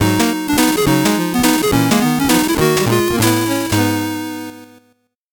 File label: 8bit jingle fast